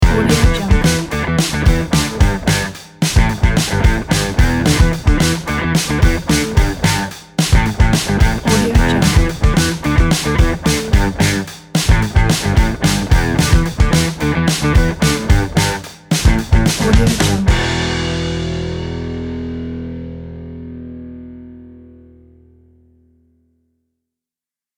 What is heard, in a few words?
upbeat rock logo